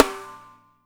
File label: PIC SN, DRY KIT; Spectrasonics - Burning Grooves CD2